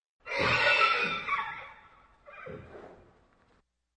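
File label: neighing in the stall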